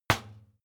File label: trash basket metal hit bottom